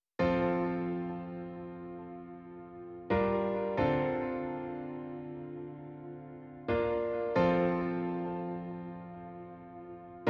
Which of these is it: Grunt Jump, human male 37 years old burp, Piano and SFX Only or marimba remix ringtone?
Piano and SFX Only